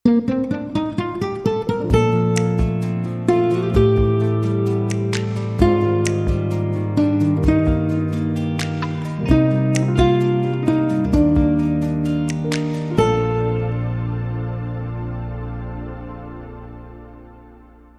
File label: Mellow Groove